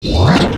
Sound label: REVERSE; JUST FOR FX